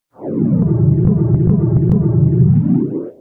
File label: lift